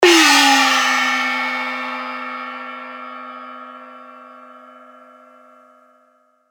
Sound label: Crash, cymbals; revealed cymbals vol 1